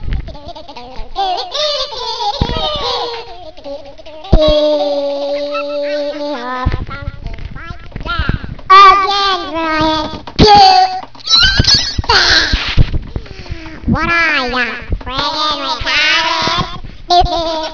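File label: squeak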